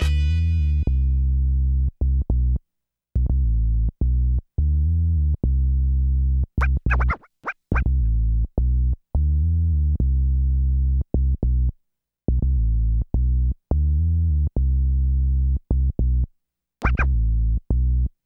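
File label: bass 105 E minor; ProSamples vol.34 - Hip Hop & RnB 3; Sexy Susan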